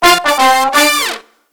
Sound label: BRASS LICKS, Disco Fever; Best Service ProSamples vol.48 - Disco Fever; AKAI